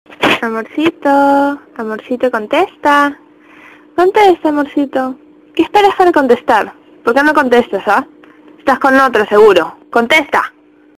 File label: Ringtone